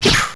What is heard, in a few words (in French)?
fouet